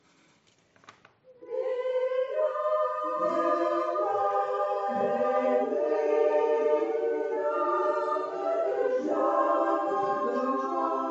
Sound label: tutti; la pluie